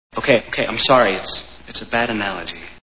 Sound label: scream